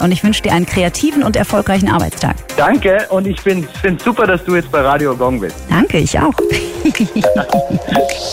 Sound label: gong